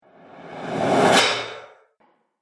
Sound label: Metal Whipcrack Whoosh